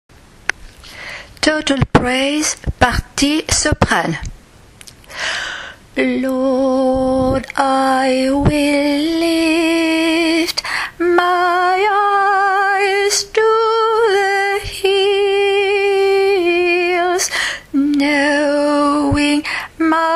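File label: soprano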